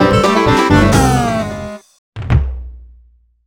Failure Sting; Back in the Barnyard: Slop Bucket Games; NDS

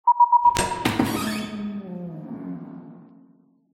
cutscene door; CHica; Five Nights at Ronald's

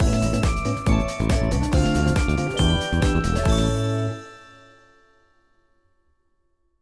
Win; Soraya

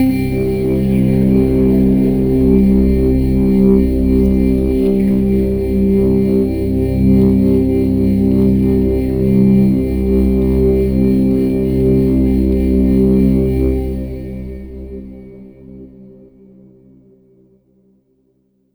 Downtown Overlay Drone

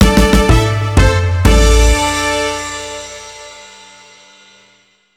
jingle; Photon Cube; PS Vita

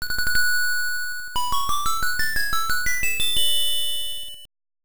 Get Wind Marimba; legend of zelda the link s awakening 1993 gb

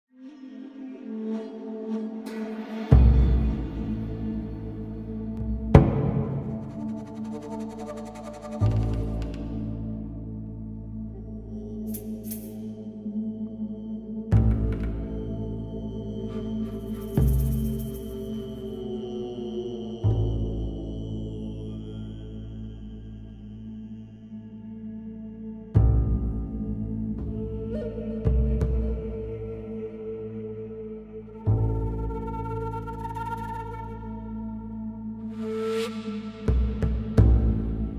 Tribal Forest; The Wild West; roblox

